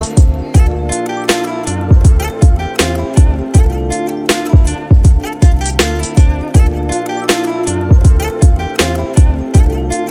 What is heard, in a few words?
bgm Ringtone instrument